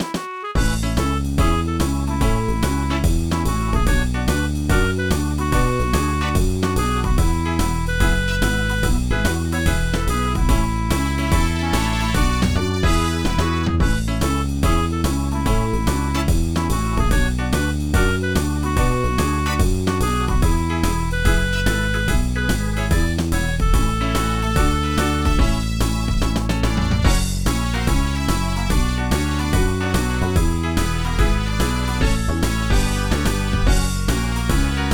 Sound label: Party; Dogz; NDS